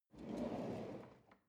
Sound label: drawer cutlery draw out